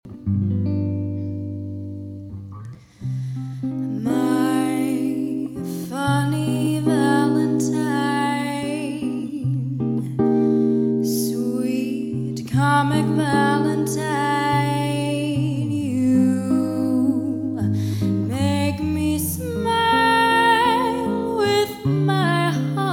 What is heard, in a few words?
Jazz Soprano